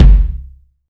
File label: tight kick drum G key